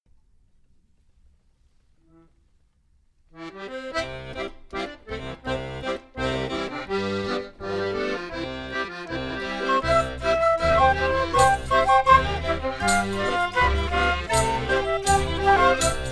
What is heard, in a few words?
Bayrische polka